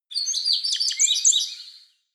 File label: songbird sing